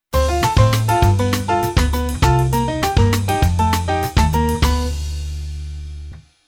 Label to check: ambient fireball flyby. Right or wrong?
Wrong